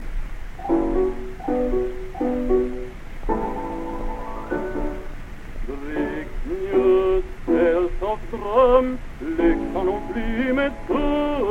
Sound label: Gramophone